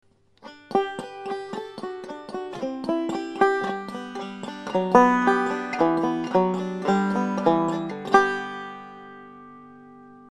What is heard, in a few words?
ending